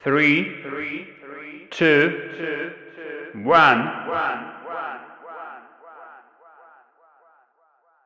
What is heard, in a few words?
funny tone ringtone